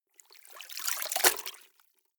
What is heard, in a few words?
clothes water updown short, haushalt; haus-garten